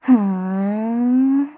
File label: section fail; Seoul v11 Asta Edit